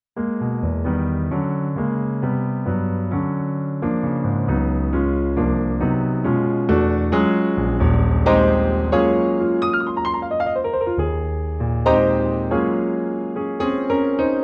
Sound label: Premier Grand; patch demo; 16-bit, 44.1 kHz stereo; xp-80; roland; roland demo cd-3